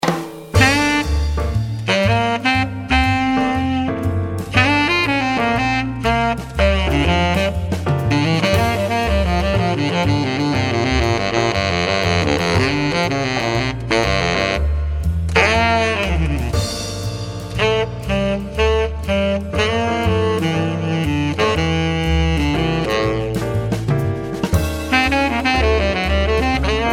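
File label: Soloist Bari, sax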